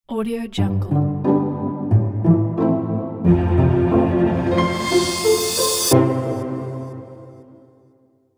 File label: elegant pizzicato logo